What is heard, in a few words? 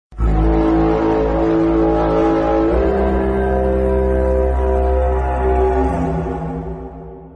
Tripod Sound